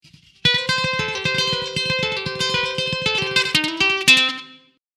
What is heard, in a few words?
test Country, ukulele; RISA